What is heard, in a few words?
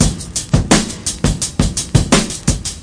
085 bpm compressed outdoors natural loop, drum loops and breaks